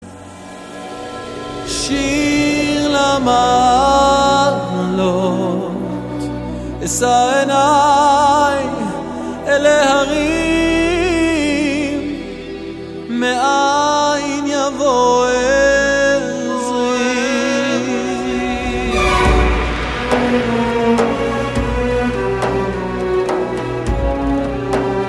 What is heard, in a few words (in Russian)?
evrejskie pesni